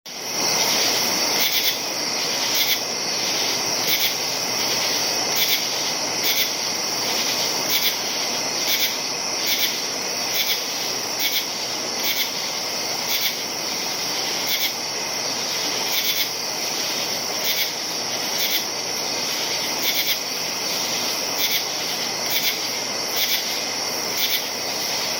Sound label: katydids at night